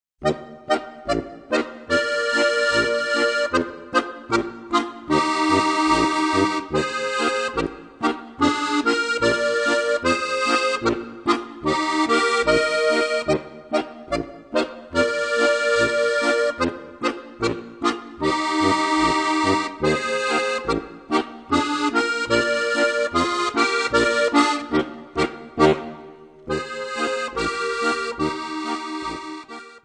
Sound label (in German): boarischer; Spielstücke für Steirische Harmonika, Band 1